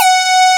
PL MOOG; Wizoo - Powered Nord; STEREO LAYER

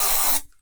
Fly Spray; Hits

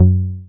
Softy Bass; Best Service Gigapack 2

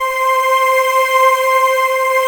CHOR, Synthesizers

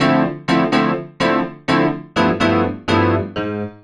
E PIANO 125, Keys; Houseworx

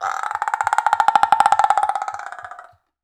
ROAR, Perc Toys; Spectrasonics; Supreme Beats; World Dance

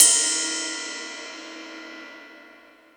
Jazz Drums, E-MU Pro-Cussion; drum machine

